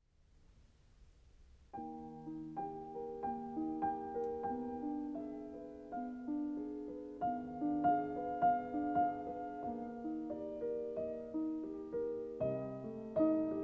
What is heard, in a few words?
Arietta, lyric piece for piano; Grieg